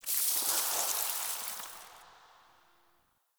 Deactivate B; Powers; Fey Eldveil

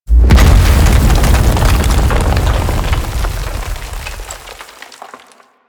Thumper Bot Spawn Emerge Dirt A; Warframe; Grineer

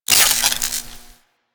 Mag Spark; Archwing Intro Cinematic

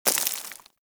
Bullet Impact Debris Dirt; Warframe; Weapons

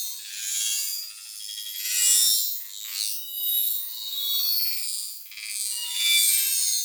Security Camera Loop; Sentient; Warframe; Ambience; Sentient Devourer

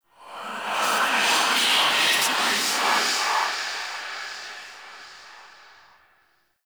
Whisper; Enemies; Stalker Acolyte

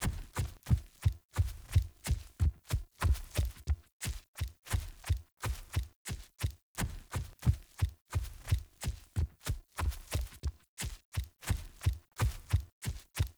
Wolf Steps Jog Loop Default; Animals

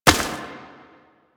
Step Dirt, Footsteps

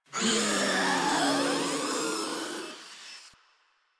Infested Ancient Death; Warframe; Proto Infested

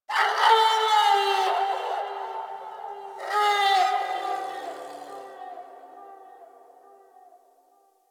Distant Creature; Ambience; One Offs; Warframe; Eidolon Plains

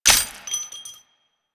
Modular Primary Handle Reload, Guns; SU Modular Guns; Reloads

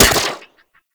Speeder Rifle Fire

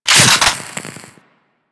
Akimbo Pistol Clip Eject; Grineer

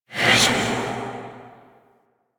Fire Whispers; Warframe; Tenno; Grimoire

